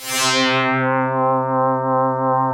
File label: HEAVY SWEEP, PADS; Rubber Chicken Software Sample Bank; REAL INSTRMT